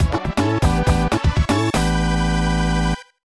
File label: 2-Player Game Over; pac attack